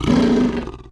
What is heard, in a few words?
death; FlyForInsanity